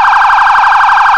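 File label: priority, sirens; gmod; vcmod; els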